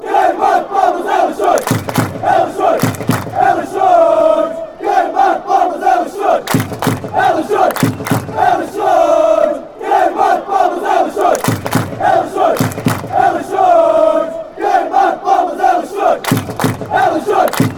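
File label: clap, football songs and soccer chants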